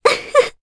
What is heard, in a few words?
Vox Laugh jp; voices; heroes; Ophelia; kings raid